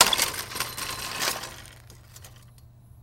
Bike Old Rusty Droppi

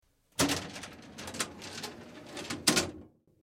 Metal File Cabinet, drawers

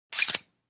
Safety Belt Click, seat-belts; accessories

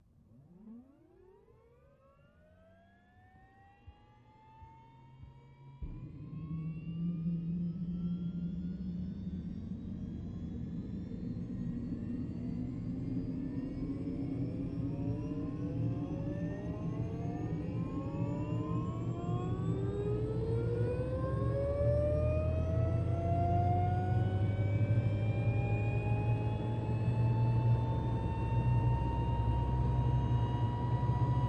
OH6 Loach Interior, little bird

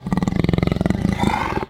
Leopard Growl Hollow; big cats

